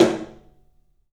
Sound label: TOM, 3D Percussives